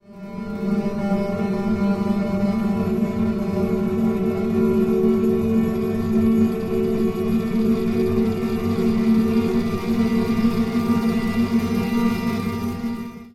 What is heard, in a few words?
grains guitar